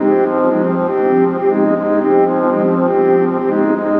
Pad, Trance

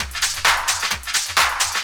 drum 130, techno trance drumloops; Techno Trance Essentials

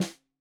Snare 65 Reg Stereo, Deeply Sampled Drums

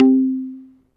T.BASS2 C3, TAKK-BASS, Yamaha VP-1; Music Store

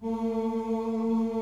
B1 MALE CHOIR; CHOIR OOS

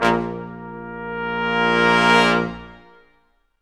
BRS, Quintet sfz; Roland L-CD702

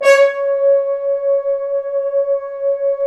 BRS, FHns Accent; Roland L-CD702; Accent-Swell

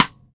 LD CStick, Rimshots; Roland BK-5

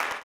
CLAP, blues & soul; ILIO; Vocal Planet

